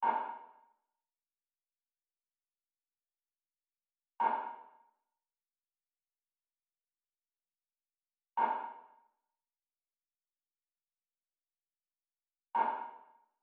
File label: Drum Loop, 115 BPM Percussion; Loop Stems; Cymatics; Vibes II Drum Loop Collection; Round Two